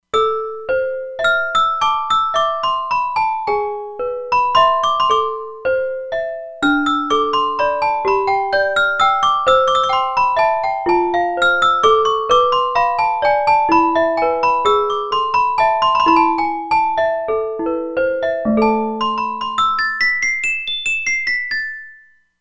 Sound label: Celesta, E-mu Proteus-2; Factory Presets